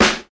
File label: 00s smooth hip hop snare g sharp key